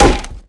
cane hit

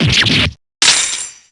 damage; lance

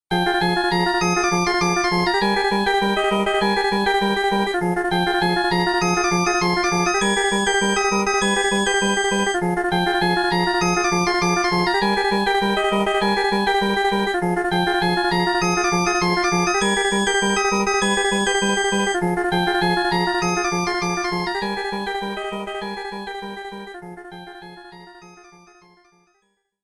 ringtone

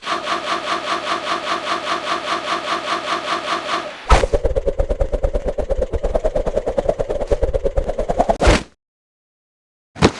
breakdown; Gustavia

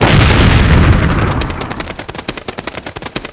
breakdown; alita

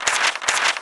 page turn x2

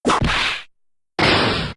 whip crack

